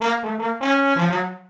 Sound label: Trombone Missed note; Levels Hub Diner 07 Light Street